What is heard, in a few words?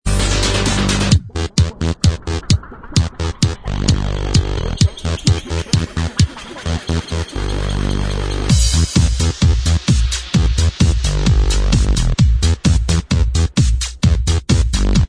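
instrumental, backing track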